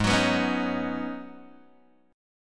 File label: G sharp Minor 11th G sharp Guitar Open G, chord